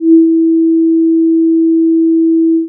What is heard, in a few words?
brass envelope